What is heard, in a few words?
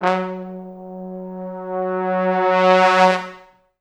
Pop Brass; Best Service ProSamples vol.19; AKAI